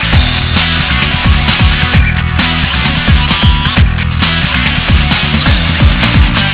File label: Power Off, phonetones system